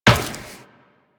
Run Metal Perforated, Footsteps; Warframe; Tenno

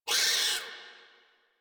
forest rodent vocal pain; Characters; Warframe

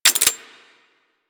Air Pistol Mech, Weapons; Warframe; Corpus

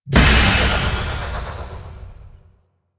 Death Explosion

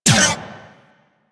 Cart Turret Laser Shot; Warframe; Venus; Venus Turrets

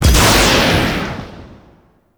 Spear Throw Impact; Warframe; Weapons; Corpus; Crp Spear Gun; Ferrox

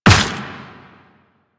Run Dirt, Footsteps; Warframe; Quests; The New War; Kahl

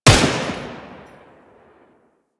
Tommy Gun Shot Initial; Weapons; Warframe; Tenno

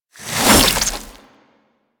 Inf Boomerang Whoosh C; Warframe; Weapons; Infested

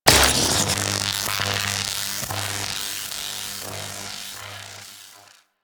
Spear Throw Tether Activate C, Weapons; Warframe; Corpus; Spear Gun

